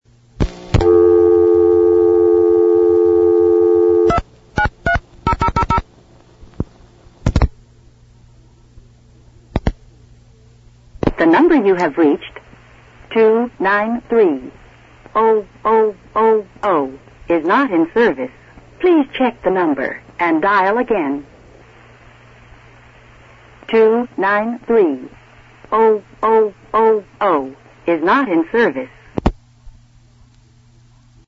old, vintage telephone sounds; miscellaneous vintage sounds

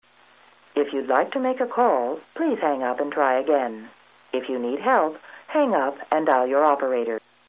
hangup, modern north american telephone sounds; various virginia telephone recordings

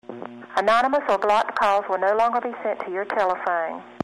block, telephone sounds; various virginia telephone recordings

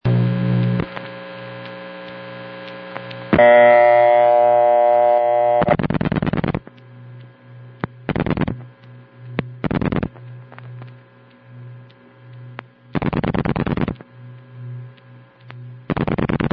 Oldphone, vintage telephone sounds; miscellaneous vintage sounds

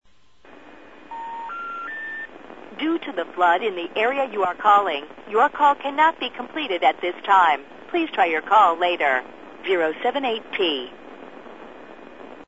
AT&T flood, telephone sounds; modern north american telephone sounds; AT&T general error recordings

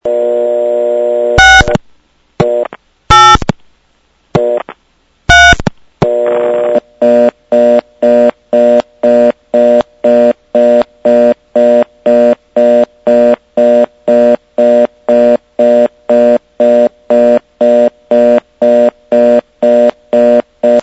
reorder, telephone sounds; vintage telephone sounds; private collector step by step

